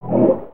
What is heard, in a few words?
Pet Bison Grunt; creatures pets